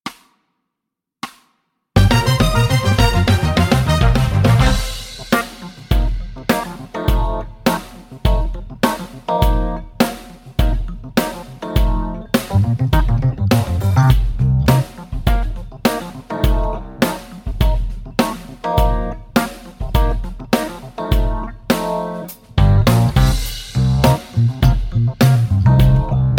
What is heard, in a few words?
c minor funk 105bpm